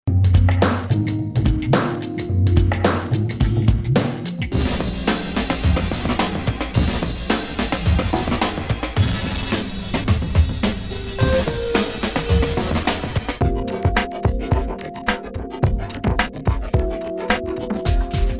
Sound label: Double Joy Loop